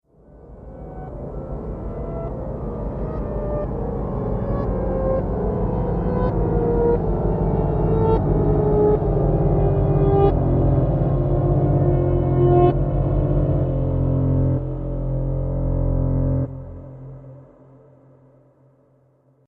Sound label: Horror Dark Piano, Piano Sound Effects; musical